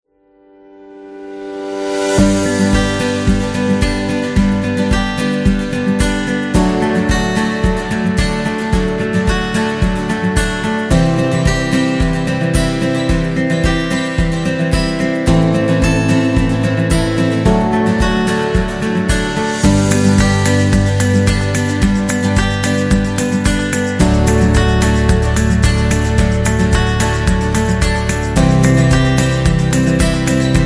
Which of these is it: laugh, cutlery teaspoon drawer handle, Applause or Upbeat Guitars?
Upbeat Guitars